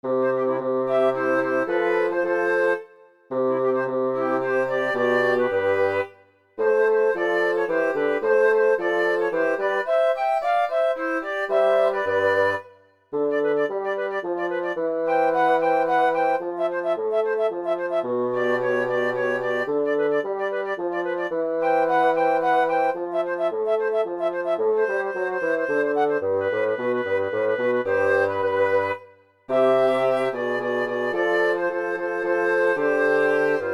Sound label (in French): trio